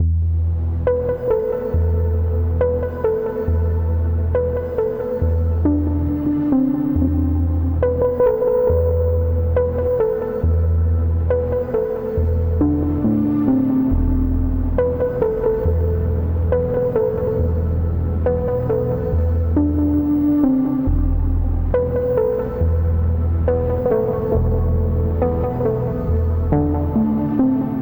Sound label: Moody Bass - Emin 69 bpm; MIDI Clips; Tonal; Motifs; Ableton; Factory Packs; Mood Reel